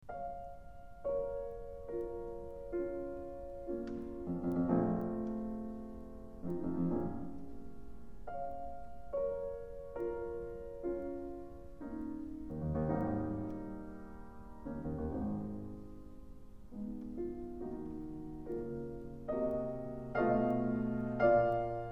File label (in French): sonate piano; no3; brahms